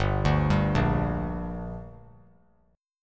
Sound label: G Minor G down, chord; arpeggiated; JGuitar